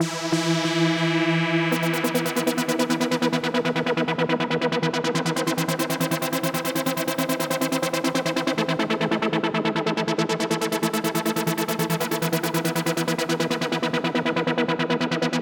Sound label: Arp